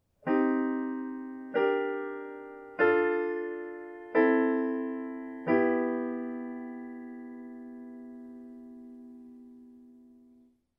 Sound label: Progression using minor chords